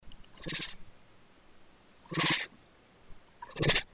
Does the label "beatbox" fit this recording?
No